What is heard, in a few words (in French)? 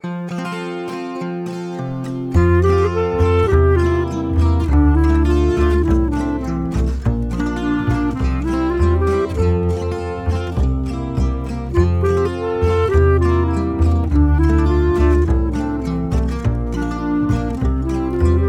version instrumentale